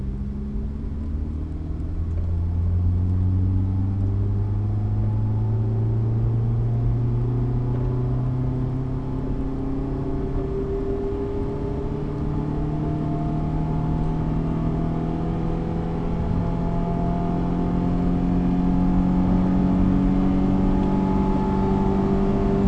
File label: inside cruise; s2000; s2000 modifications parts